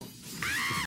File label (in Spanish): gaviota heart